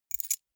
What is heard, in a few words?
key ring small grab